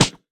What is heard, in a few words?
catch; HeavenStudio; basketball Girls